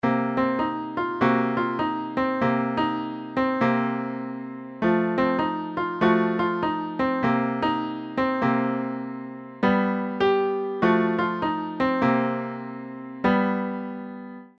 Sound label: blues shell voicings